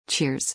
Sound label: cheers; 英語で「ありがとう」